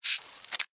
Alien Spider Web, Scifi, Alien, Spider, Web, Shoot, Insect, Science, Fiction; SoundEffects-BlastWave